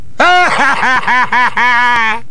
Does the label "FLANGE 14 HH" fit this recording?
No